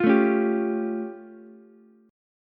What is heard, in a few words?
A Augmented 7th A, Ukulele; strummed; JGuitar